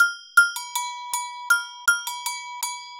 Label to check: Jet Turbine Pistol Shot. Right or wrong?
Wrong